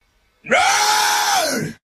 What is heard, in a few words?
raaaugh